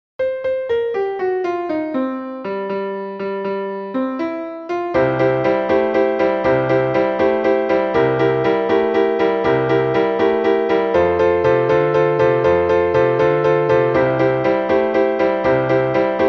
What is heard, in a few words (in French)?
call and respons accompagnement